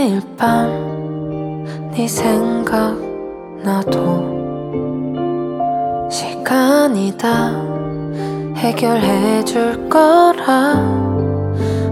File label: We re done Female Version